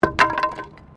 Wood Fall

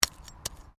Digging Small Handheld Tool Rocks

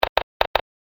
A Series Of Clicks

